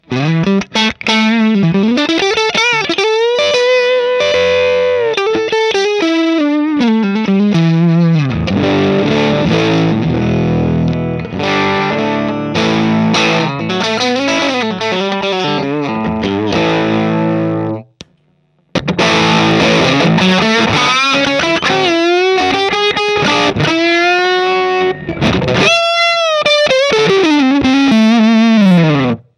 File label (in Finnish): ecc81 6l6 gainia ja enemman gainia; uraltonejr